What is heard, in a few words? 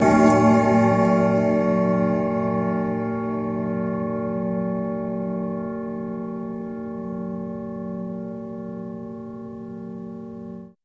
Clock Grandfather